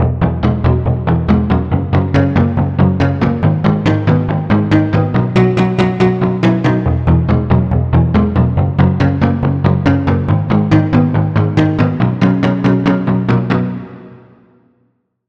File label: Pluck; Flame Sparkle; Routed Sound; Vital Expansion - Flame Summoner Vol.1